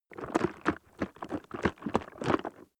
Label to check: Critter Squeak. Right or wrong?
Wrong